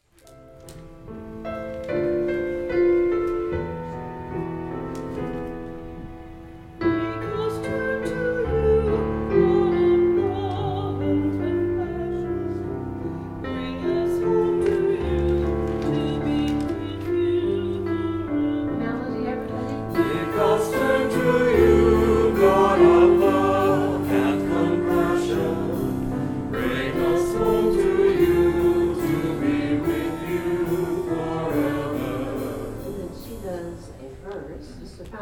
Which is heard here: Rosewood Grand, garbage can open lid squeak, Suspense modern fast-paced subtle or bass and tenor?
bass and tenor